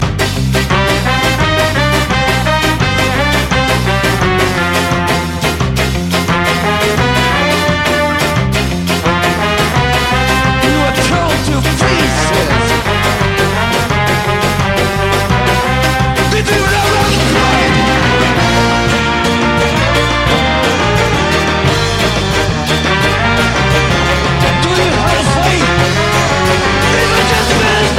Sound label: Ska